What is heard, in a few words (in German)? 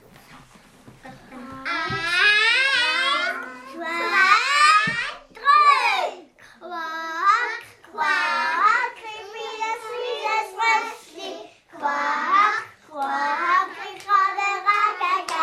Quak quak